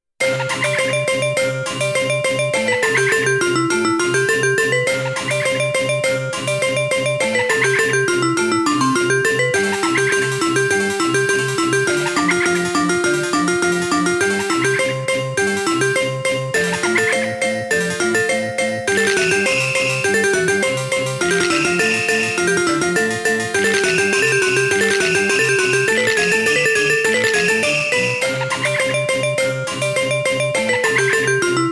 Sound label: Cembalo Rumba; Fuu Un Mokushiroku; Savage Reign; Carol Ending